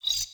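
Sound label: ui mission finish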